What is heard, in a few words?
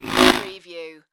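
Production Element Distortion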